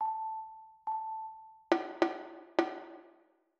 rhythm compound 2 beat

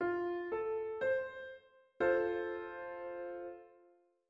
triad Major F4

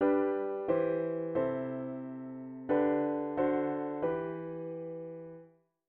chord prog chunks diat inv and V7

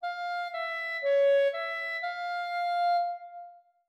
5 notes F min

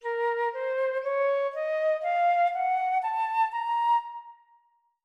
harm minor Bb4

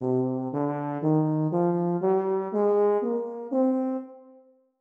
nat minor C3

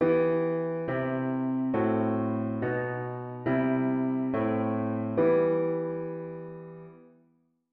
harm dict diat inv bass

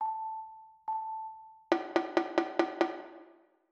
rhythm simple 1 beat

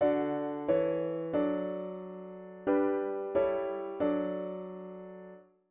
chord prog chunks diat inv and V7 inv

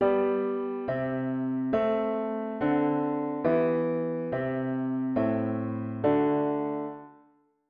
root V7 bass

